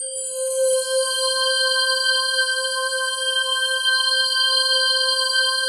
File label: DIGI PAD